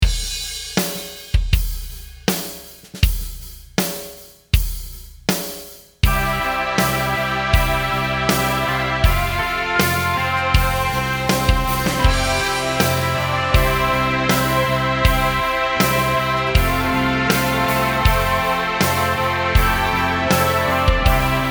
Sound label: Behringer Solina Postpunk